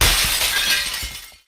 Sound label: window break